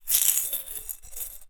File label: Shake In Glass, Medium Wine Glass